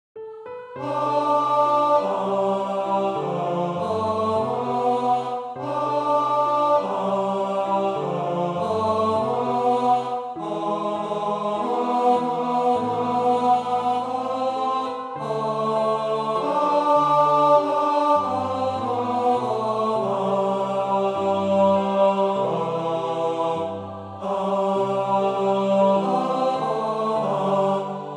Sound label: Tenor